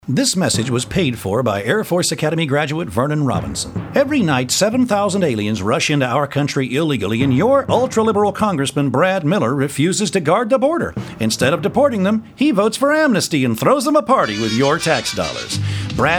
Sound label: mariachi